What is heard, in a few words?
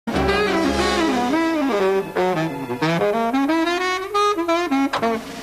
solo